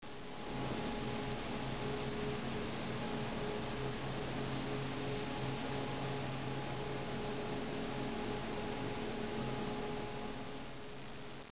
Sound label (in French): Machines Son